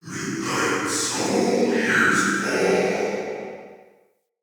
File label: Ghost Soldier Vocal Idle; Enemy; Wandering Ghost Soldier